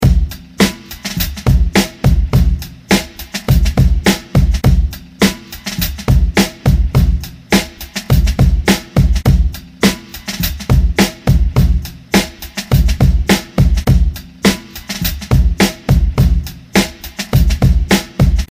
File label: Beat Study Groove Quantized